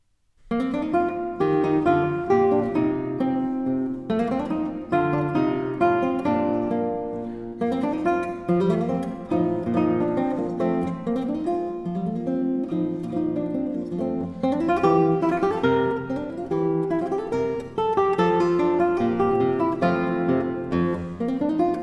Cello Suite No 4 in E Flat Major, guitar, Bourrees; Bach Transcriptions and Arrangements for Guitar